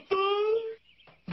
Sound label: Crispy POP